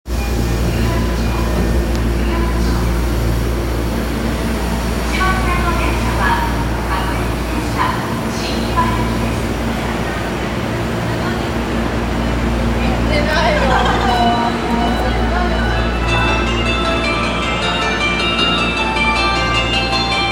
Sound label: train sounds; 有楽町線有楽町東